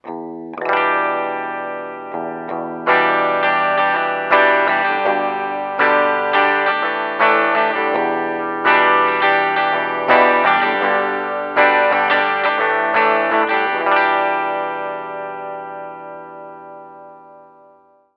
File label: brit cln; Palmer Pocket Amp MK2